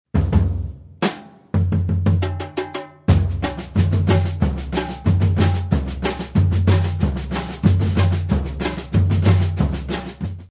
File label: open, batucada